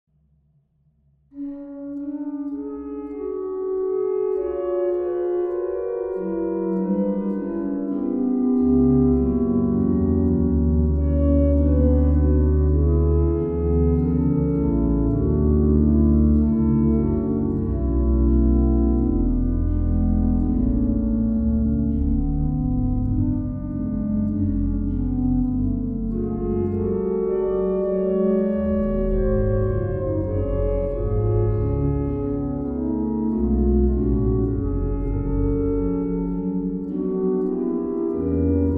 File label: Andante con Moto in Gm; Mendelssohn Felix